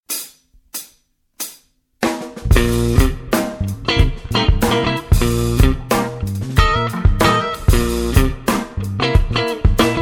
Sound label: blues jazz